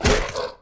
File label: CRAWLER BUNCH